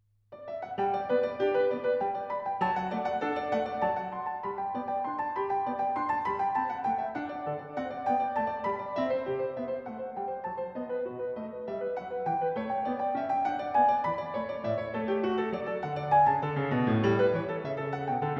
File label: Corrente; Partita no. 5 in G major, BWV 829